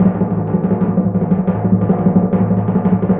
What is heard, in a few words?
TMP A#, Synclavier Percussion